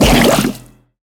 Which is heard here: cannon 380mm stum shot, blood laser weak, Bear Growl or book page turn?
blood laser weak